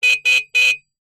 motorcycle horn honk

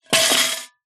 foley money collection tin coins inside drop; zapsplat

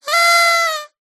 leisure party whistle squawker; zapsplat

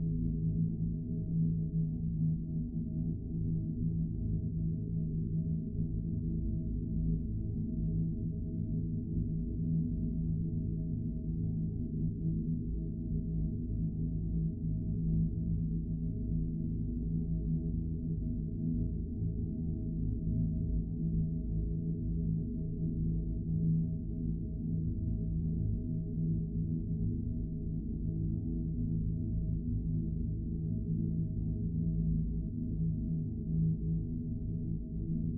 Drone dark humming chord with background rumble

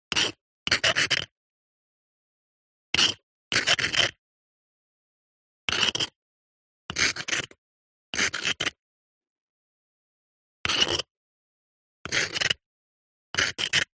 Handwriting Thin Chisel Stone Short; D&H; soundbox library

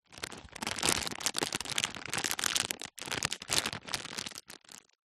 foley packet packaging plastic rustle movement

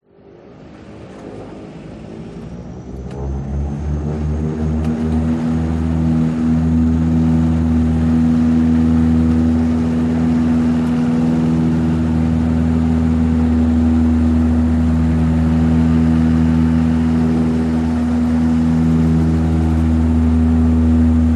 Airplane Prop; audio hero

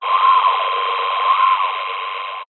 science fiction transmit error burst; zapsplat

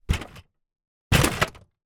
toy box bash x2 full of toys

